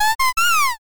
rooster

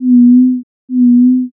owl

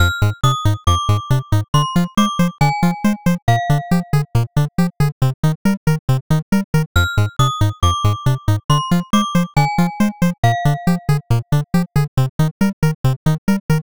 bgm

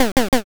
pig

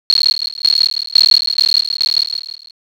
insects

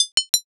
armor

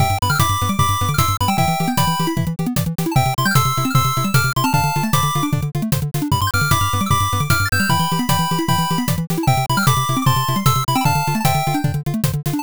bgm drive

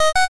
chain

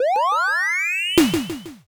defib

